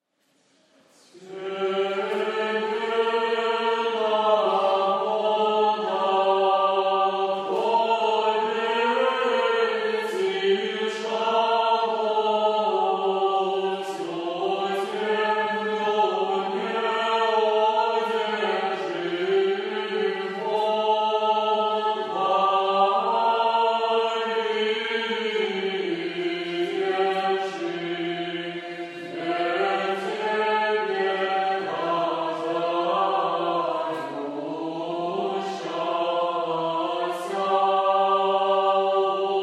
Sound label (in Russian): Новосибирский старообрядческий молодежный хор Тебе на водах. Ирмос 3 песни